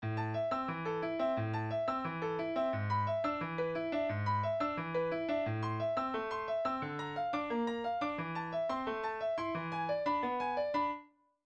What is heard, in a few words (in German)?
Klavierstuck in la minore; Manoscritto Paris MS59